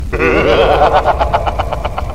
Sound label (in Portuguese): evil risadinha; akira